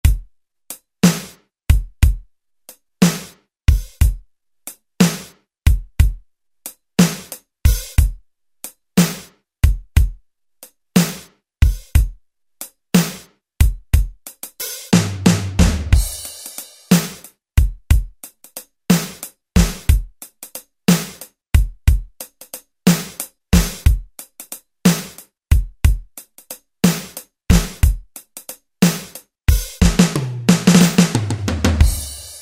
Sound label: Blues, Alesis SR-16, Preset Patterns